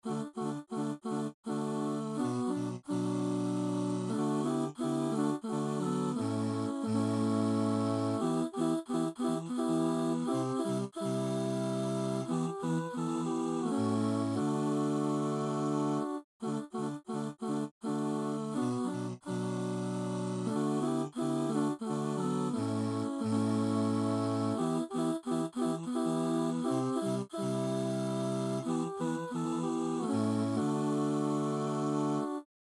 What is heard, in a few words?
Confitemini Domino